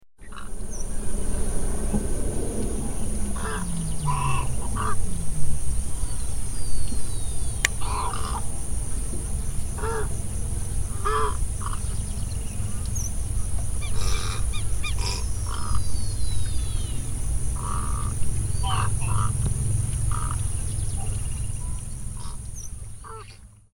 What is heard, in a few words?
Ardea alba Garça-branca